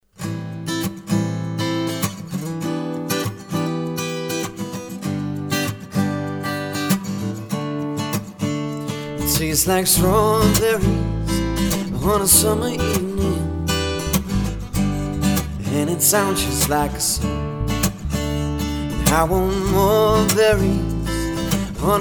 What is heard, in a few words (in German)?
Hoerprobe Club Band Saenger; voice